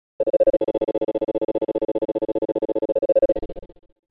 Alarm Air Lock

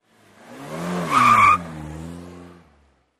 Car Peel Out Small Car

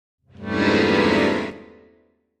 Accordion Bass Keys; keyboards

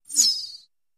Arrow Whistle Bys, bow & arrow

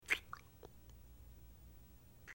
Kisses Sloppy Wet, lip smack

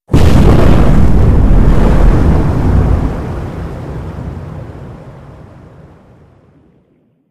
Underwater Explo, explosions

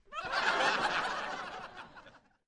Crowd Short Burst, small int

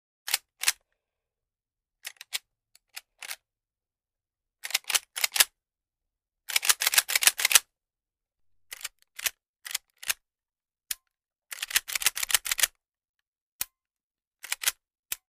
38 Marlin Rifle Movement; foley gun movement

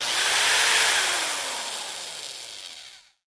ELECTRIC DRILL; drills

